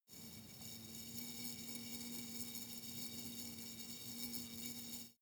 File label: hornet hum glass constant soft